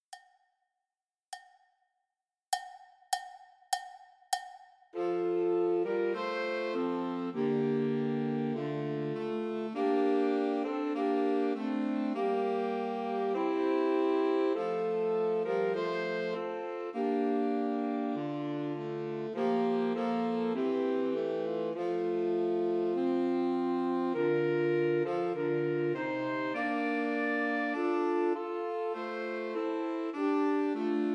Two Altos and Tenor Saxophone; Christmas Trios

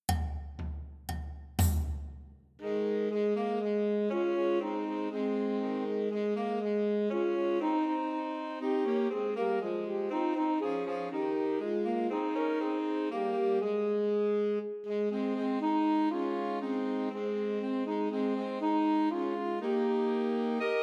Two Altos and Tenor Saxophone; Christmas Trios

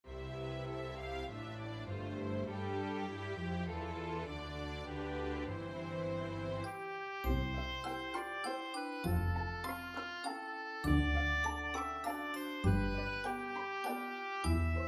Oboe Track with Melody; We Wish You A Merry Christmas

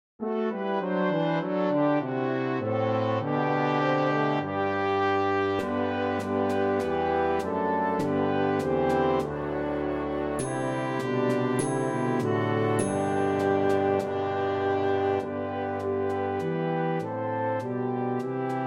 Flute track with Melody; Good King Wenceslas

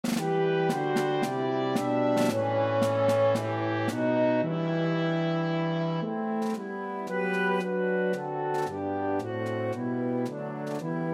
Flute Track with Melody; God Rest Ye

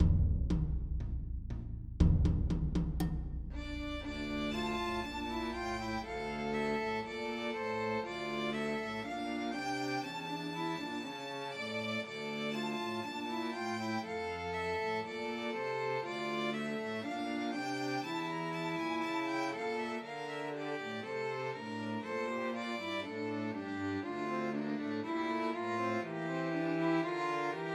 Christmas Trios for Two Violins and Cello; God Rest Ye Merry Gentlemen

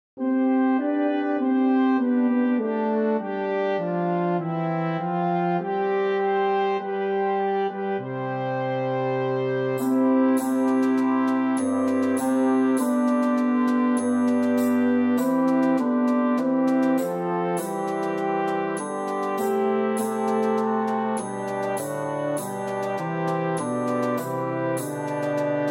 Bassoon track with Melody; O Come All Ye Faithful

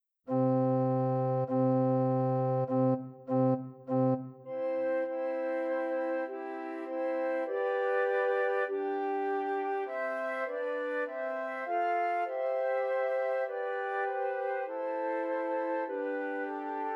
Trios for Three Descant Recorders

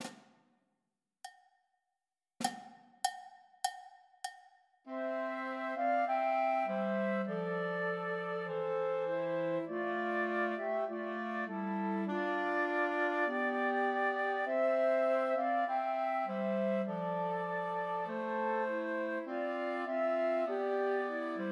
Trios for Two Flutes and Clarinet; In the Bleak Midwinter; Christmas

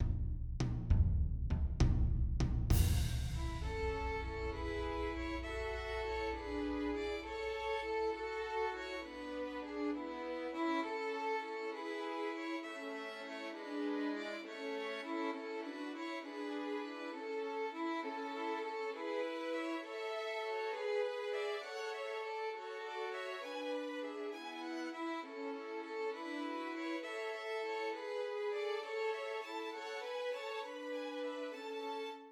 Trios for Three Violins; I Saw Three Ships; Christmas

